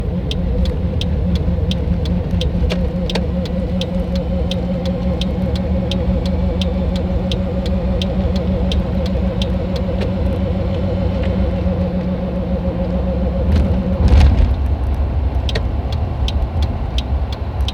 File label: help intermittent front end vibration truck noise